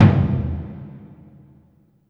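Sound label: Burundi drum, Heart of Africa; Spectrasonics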